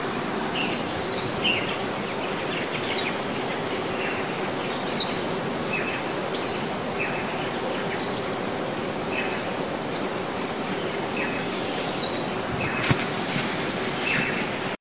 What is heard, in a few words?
Greenish Warbler Ssp Plumbeitarsus; pouillot a pattes sombres phylloscopus plumbeitarsus; Hong Kong Mai Po